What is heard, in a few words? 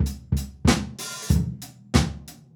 basic drum